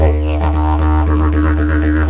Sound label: didg roll, didgeridoo; world